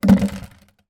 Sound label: leisure draughts checkers outdoors large plastic piece down drop